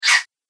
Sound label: Fast Air Whoosh